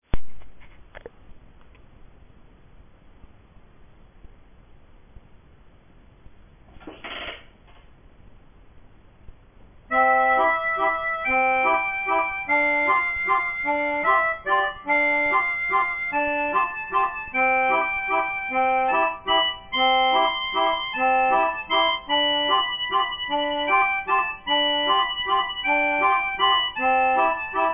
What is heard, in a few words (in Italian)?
TASTIERA